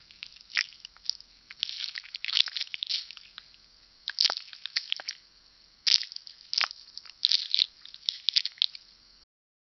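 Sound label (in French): frottement de corps contre, pillow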